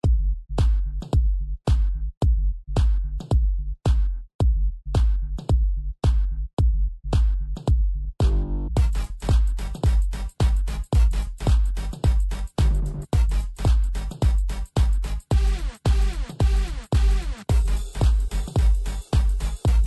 percussion; virtual dj

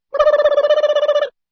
CARTOON BELL

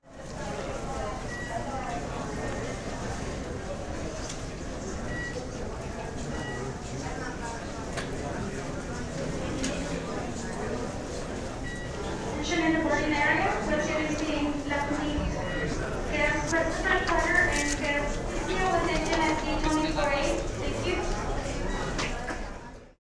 Airport Boarding Gate Ambience

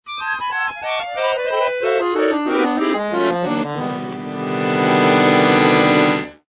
ACCORDION

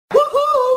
woohoo

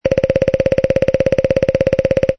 BIRD WOODPECKER

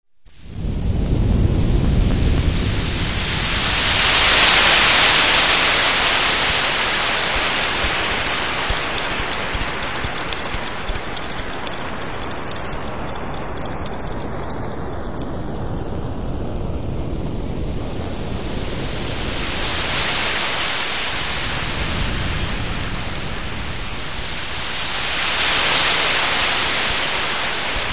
cyber sea

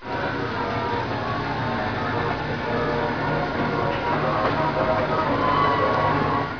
people; carrusel

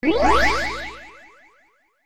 ALERT

